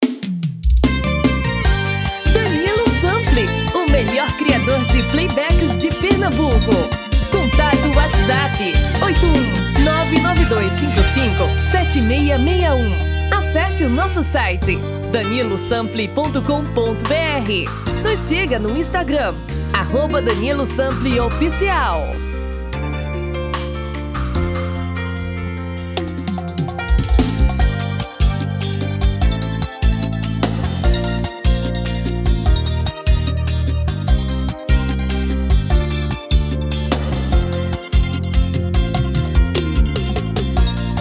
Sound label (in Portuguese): BREGA